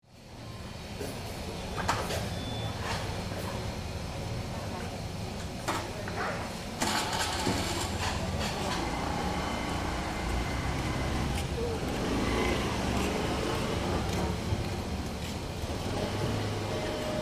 Street market ambience small crowd

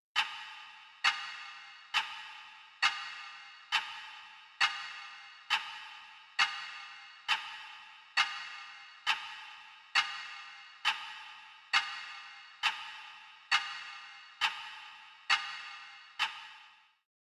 Grandfather clock reverberating in a hallway

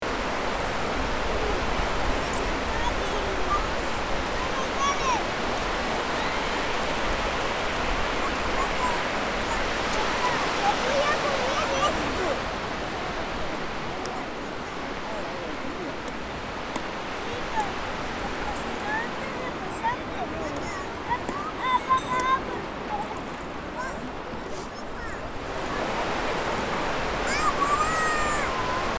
Beach playground kids playing loop

